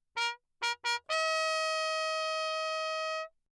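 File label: trumpet mute Bach signal; JoRal; Perinétventiltrompete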